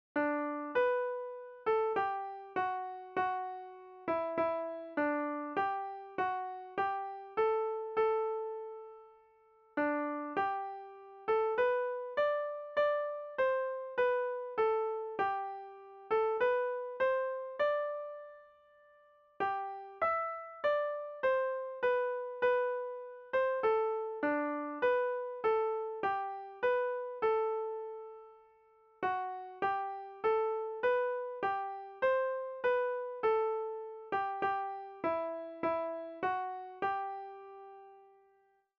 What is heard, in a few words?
hymn melody